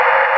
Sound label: NOISE C3; Big Fish Audio; Synth City